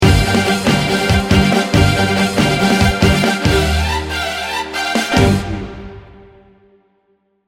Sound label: breaking news sting bumper